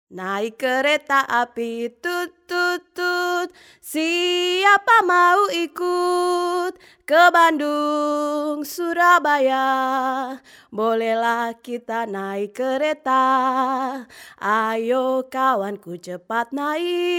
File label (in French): voyage en train